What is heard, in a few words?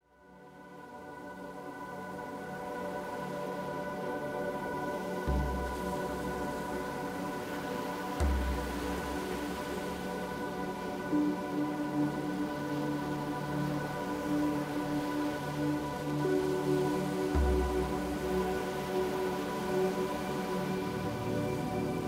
Wind Waves